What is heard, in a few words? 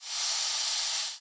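angry hissing of snakes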